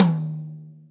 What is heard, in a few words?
Yamaha Maple Custom Tom High